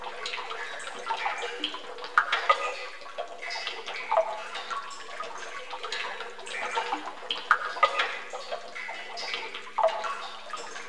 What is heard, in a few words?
Sewer Pipe Amb Loop